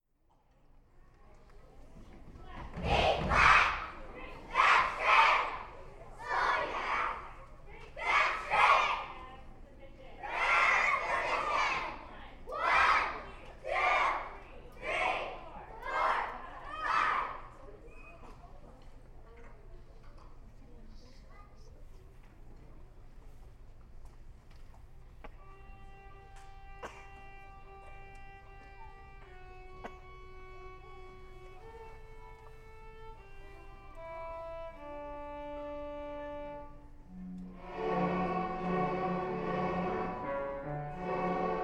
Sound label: jingle bells